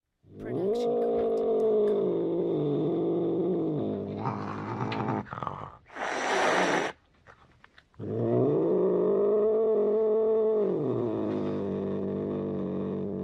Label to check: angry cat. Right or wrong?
Right